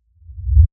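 Low Frequency Effects Ramp Short, Production, Element, Lfe, Ramp, Short; Rumble; Sound Design; Blast Wave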